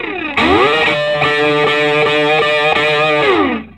SOLO, Vintage Blues Guitar

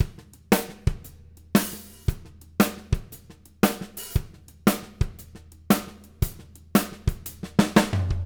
Living Drums; Sampleheads; Peter Erskine

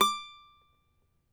CAVAQUINHO D, Guitars; Spectrasonics; Hans Zimmer Guitars VOL-2

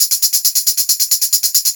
Brass Tamb, Perc Loops; Drum Tools

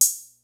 MEGA KIT, DRUMS; Kid Nepro; Elite

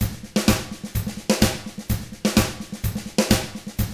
127 Drums

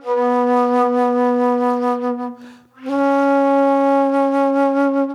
Mellow Flute

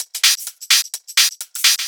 Hihat Loop 128 BPM; House; Best Service; House Definition VOL-1

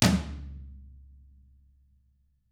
TAMA TOMR, flam; ILIO; Double Platinum Drums 1

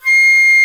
FLUTE C7, WOODWINDS; Greytsounds VOL-1

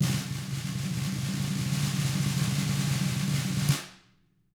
TAMA BR RL, Drums; ILIO; Double Platinum Drums 1

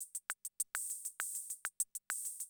CR78, DrumLoops

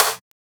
GATE SN, Akai MPC-3000; 300 Drum Machines

